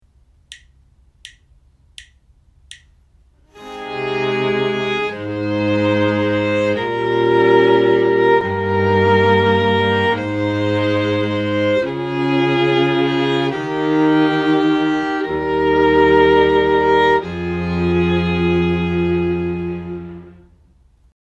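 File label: harmonic dictation, string quartet; ear training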